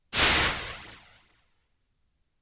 vanish; 落下音・はねる音

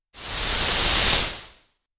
snake voice

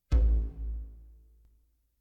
Monitor Low

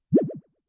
jump; 落下音・はねる音

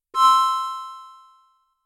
light up

